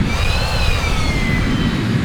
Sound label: Jetcar Rev